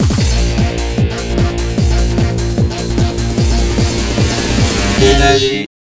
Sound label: jingle